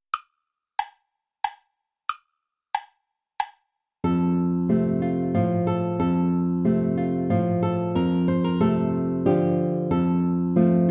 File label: waltz play along without guitar